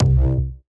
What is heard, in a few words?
Bass C1, Grime, 138bpm; Zero-G Sound Sense Grime Scene; Construction Kits; Da Suspects